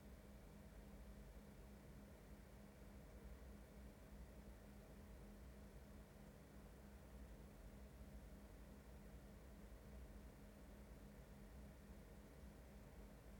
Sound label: noise